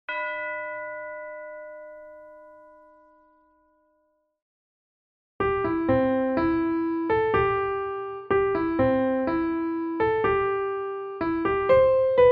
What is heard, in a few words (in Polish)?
melodia filipinska; szkola na tak